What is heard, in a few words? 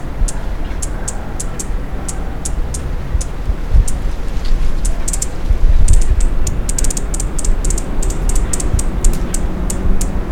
Thalurania furcata antagonistic